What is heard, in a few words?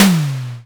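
snare drum, Simmons SDS8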